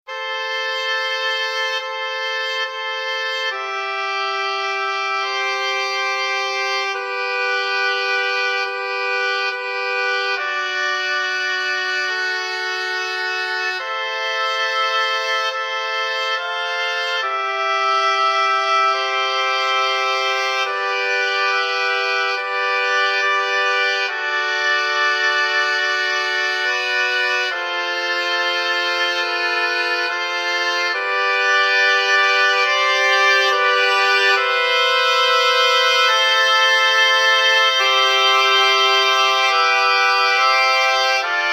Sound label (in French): Trio de hautbois Débutant